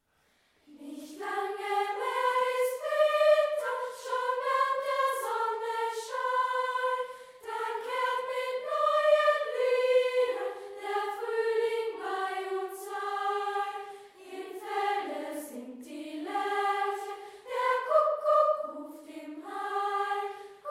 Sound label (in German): Nicht lange mehr ist Winter einstimmig